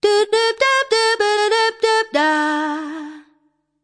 100 bpm Line; VOCAL OR VOXY LOOPS

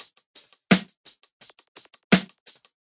85 snare and pipples, drum loops and breaks

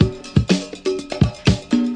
122.5 bpm conga loop

